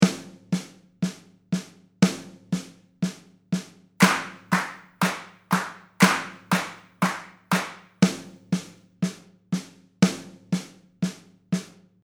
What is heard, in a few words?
4 4 clapping in time